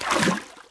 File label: splash, footsteps